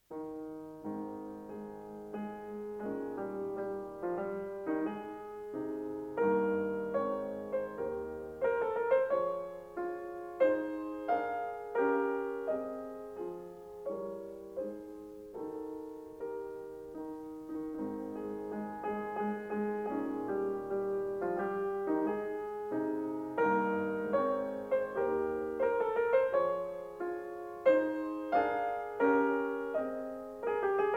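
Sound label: Piano Sonata No. 12 in A flat major; Wilhelm Kempff; Beethoven; Piano Sonatas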